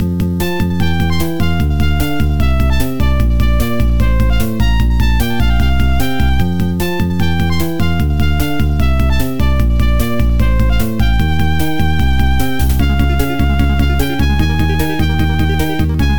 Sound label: 8-bit OPLL Remix; Sonic 1